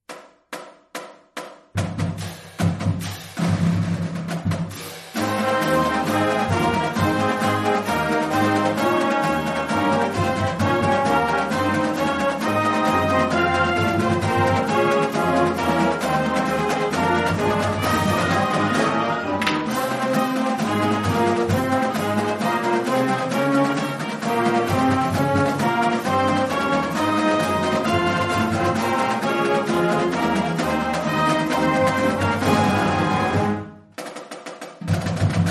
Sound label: fight song band hall